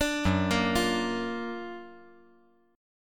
F sharp Augmented Major 7th F sharp; F#+M7 arpeggiated

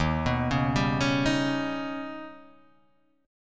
Eb Augmented 7th Eb down, Guitar Half Step Down; Eb+7 arpeggiated; JGuitar

